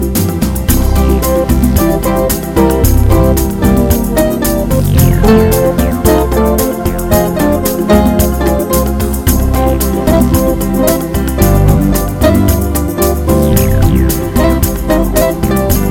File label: Beautiful and Sensitive